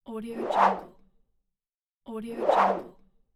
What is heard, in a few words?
soft whoosh